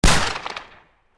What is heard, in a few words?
shoot; kawitto banditto; kiwito bandito